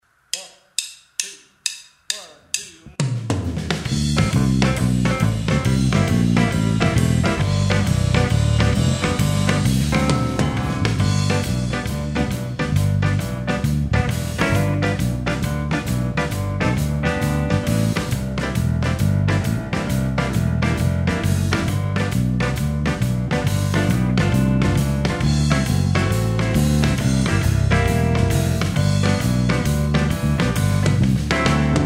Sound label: jump blues G